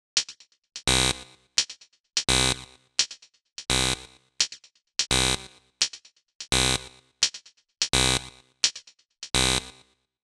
Hats 85 D

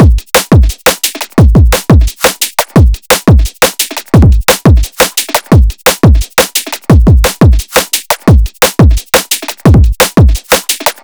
DNB FULL DRUM LOOP 174

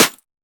snare clap

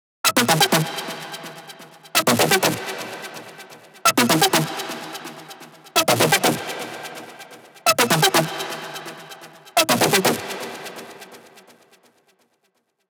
Synth Lead E 126bpm

